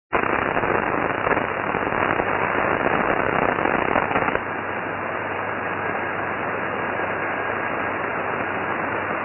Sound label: noise cpl